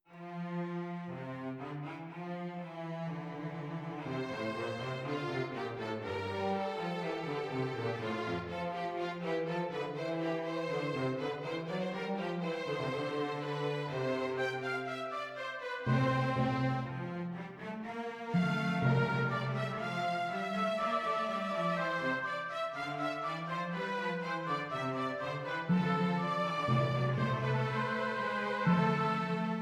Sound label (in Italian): Orchestrazione; Ronge; Gerd Prengel